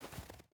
footstep boots snow walk; Rust

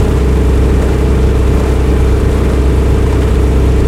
yak cockpit, engines; War Thunder

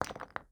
drop bone; Rust

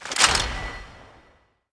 stripe; World of Tanks Blitz; GUI battle streamed

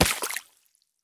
sprint puddle; Escape from Tarkov

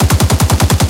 loop kpvt; guns tanks; War Thunder

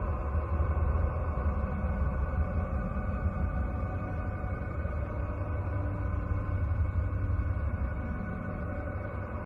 ambient battle; World Of Warships

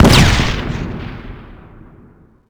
frag grenade explode near

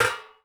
phys impact metal thin hollow; Rust

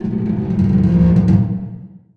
tank fire metal stress; tanks; War Thunder

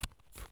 item medkit cap; Escape from Tarkov

